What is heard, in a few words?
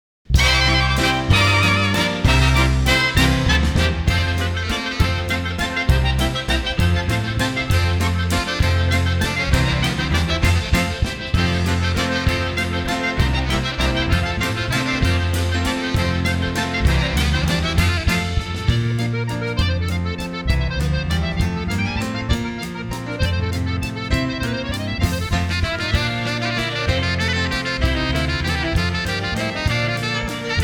Oberek